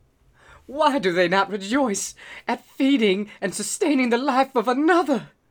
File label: Male Reaction; a dump in the dark